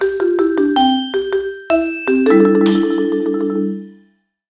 The jingle that plays when approaching the Yoshi's F